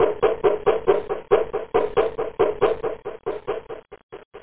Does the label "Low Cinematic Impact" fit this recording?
No